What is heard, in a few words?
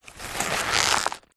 paper sheets lots scrunch up squeeze